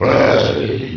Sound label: monster death